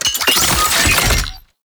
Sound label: Scan Pillar Plant Open; DE; Warframe; Warframes; Frumentarius; ScanAbility